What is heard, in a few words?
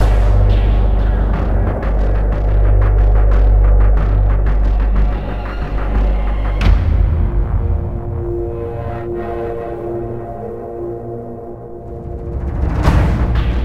Police Station Shooting Range